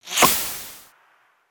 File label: Pets Skunk Stink Spray; creatures pets; LEGO